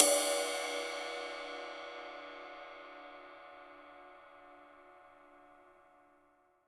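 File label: CYMBAL, real drum kit; HIPHOP KIT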